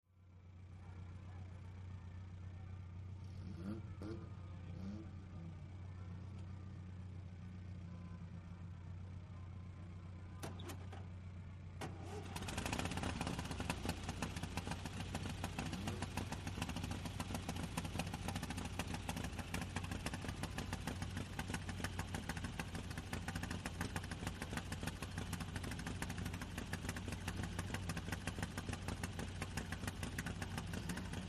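Vintage Race Car; Racing Ambience, Vintage Race Cars close up Start Ups, Idling, Shot close up From The Track; 1914-1954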